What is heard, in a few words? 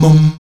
VOX MUM D#3, Afro Chants; Roland LCDP11 Africa VOL-1; Stacc Mums